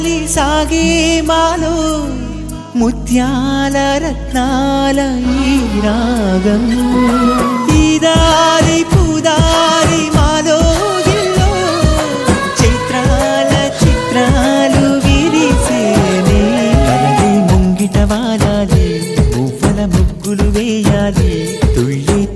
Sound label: telugu song ringtone